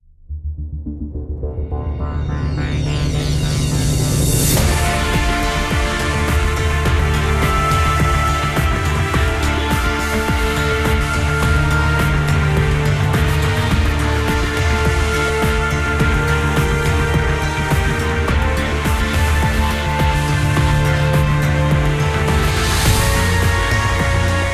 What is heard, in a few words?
Gunship Battle; mass effect 2